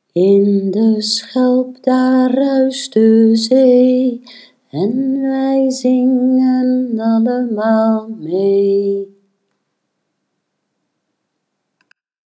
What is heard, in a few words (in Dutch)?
Schelpenlied, Liedjes; In de schelp daar ruist de zee / en wij zingen allemaal mee.